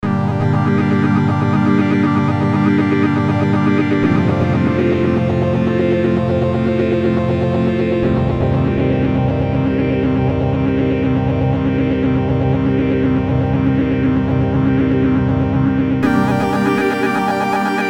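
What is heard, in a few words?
CMI V 80s Movie Sequence; Arturia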